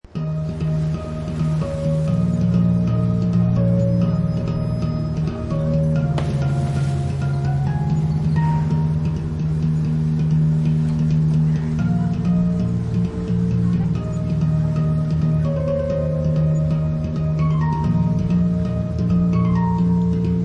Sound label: flying over the hill handpan; هنگ درام